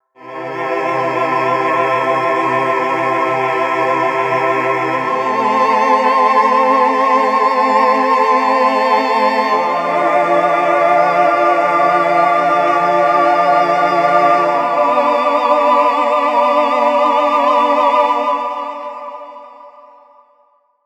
Akai MPC 2.10 Mellotron

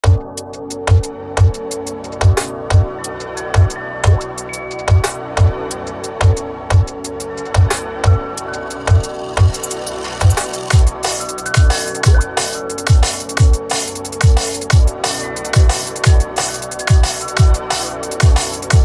liquid funk ambiente 98

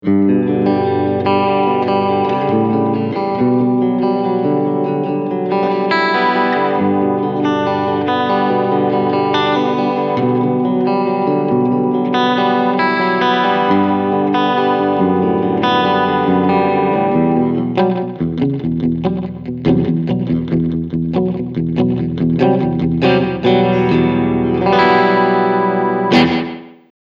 Short Delay

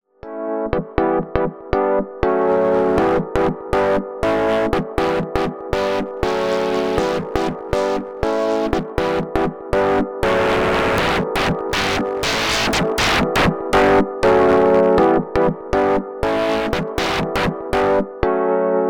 AKAI MPC LIVE II Wavefolder

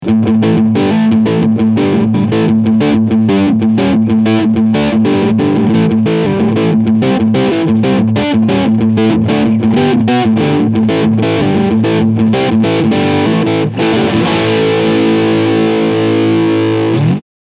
Crunchsound Singlecoil

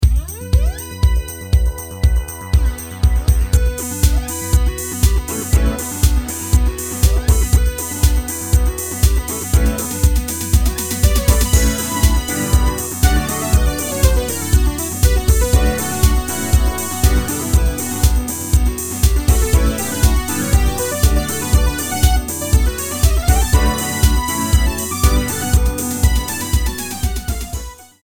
Dance Hyper Canvas

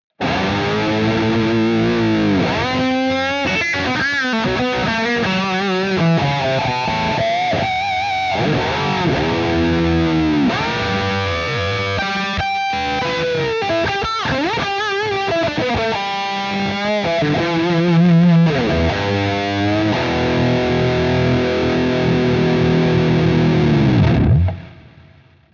Lead Amp; Archetype Petrucci

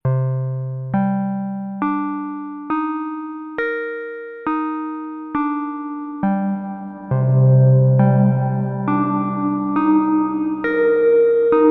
boss dc2w post reverb

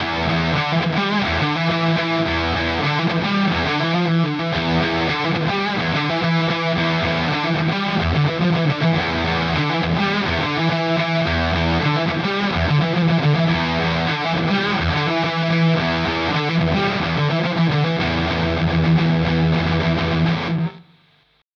Fender vs Harley Benton Fender Fuzz

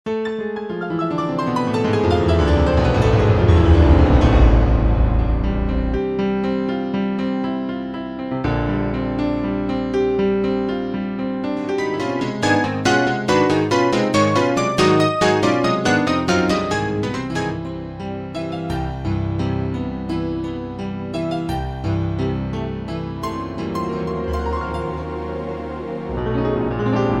Piano

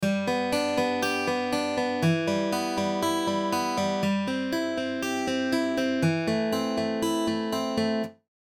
Acoustic Guitar Dry

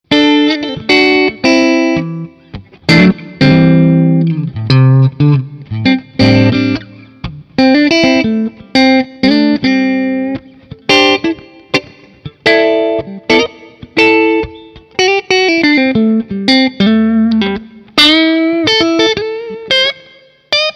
Friedman JJ Junior clean

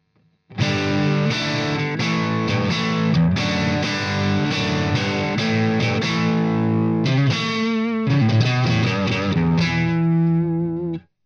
Strat high gain edge

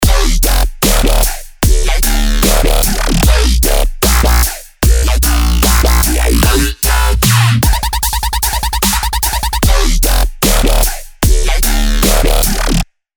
Dubstep Electro; Nexus 3; Dubstep Electro 4; Get Funky

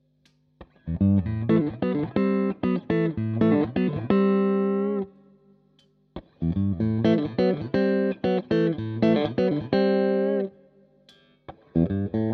Hybrid Pick

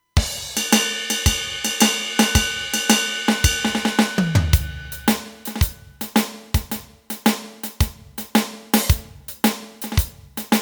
Beat

